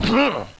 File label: fall pain